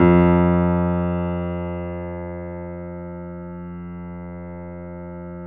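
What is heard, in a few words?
grand piano F 1; USB Soundscan; AKAI; Keyboards Acoustic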